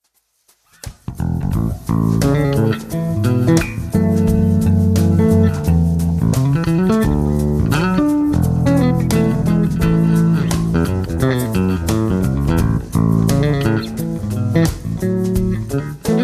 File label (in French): basse